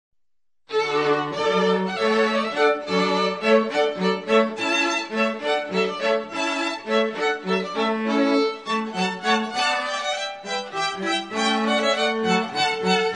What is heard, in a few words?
Hornpipe, string quartet; from the Water Music, Handel